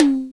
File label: drm d2, DRUMCOMPUTER KORG DDD-1; Samples vol.55 - Retro Sampler